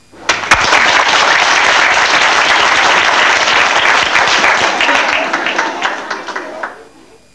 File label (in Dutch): KLAP